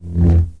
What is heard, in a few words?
lightsaber swing fast; weapons